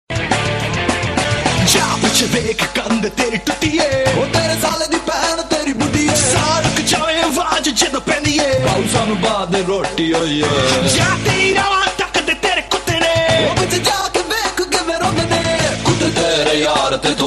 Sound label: pakistani rock